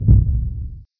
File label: Kick Alt Thunder